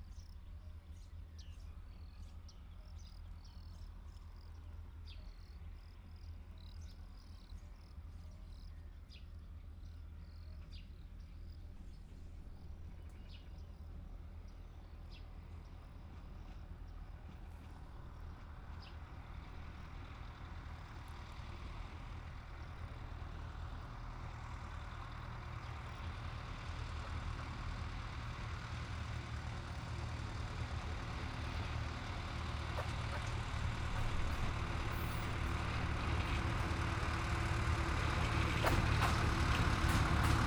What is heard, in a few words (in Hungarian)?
elhalado balrol jobbra kulso vegallomas kozeleben, kisvasut; hortobagyi halastavak